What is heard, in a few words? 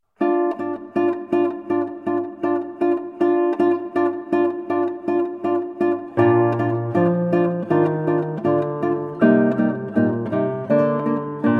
Prelude in B flat Major; Preludes and Fugues